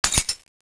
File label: button; svencoop; aomsounds